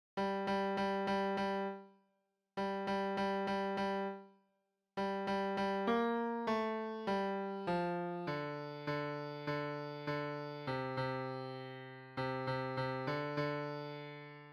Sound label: Midi bass; bella ciao we are singing